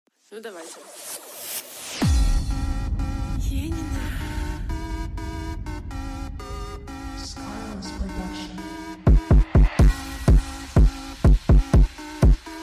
slowed hardstyle

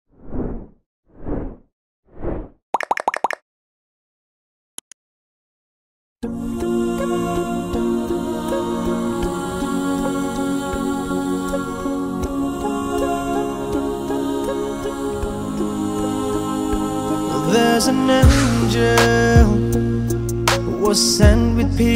vocals only

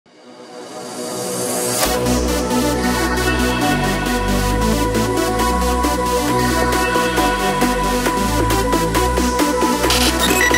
hit mix 90s

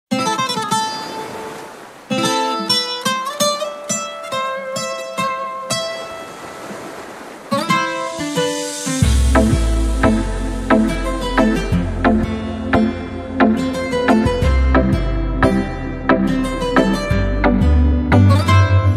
saxophone version

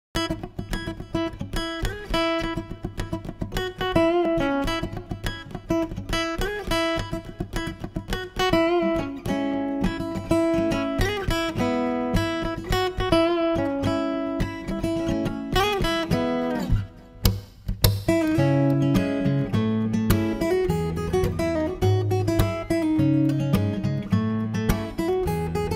cover fingerstyle guitar; табы; guitar pro и pdf

